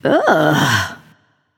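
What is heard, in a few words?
ugh, female; smite; voicepack; hera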